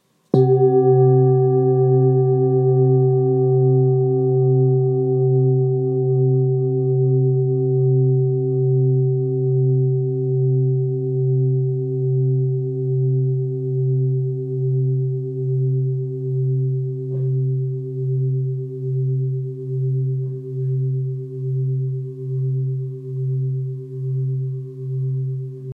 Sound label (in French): Mailloche